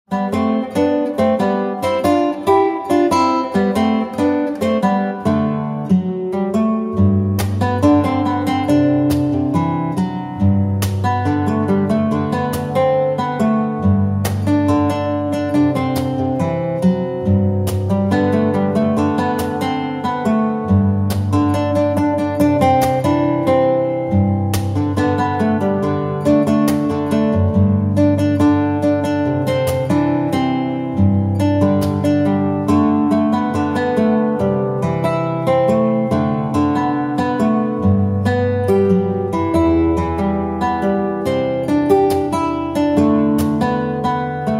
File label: fingerstyle